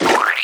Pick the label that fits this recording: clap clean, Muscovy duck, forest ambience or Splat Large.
Splat Large